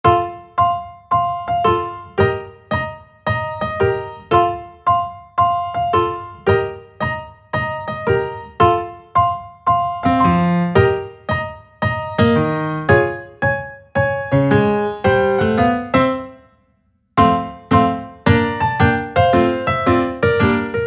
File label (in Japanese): piano; A Synopsis of Girls Who Don't Flatter Me. Original Soundtrack; Kobinai Kanojo no Shohousen